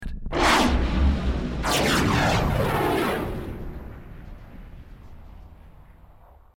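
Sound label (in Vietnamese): tiếng thiết bị công nghệ cao bay qua tốc độ cực nhanh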